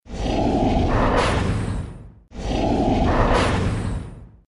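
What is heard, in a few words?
attack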